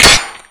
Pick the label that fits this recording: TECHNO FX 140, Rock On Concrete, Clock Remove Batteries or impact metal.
impact metal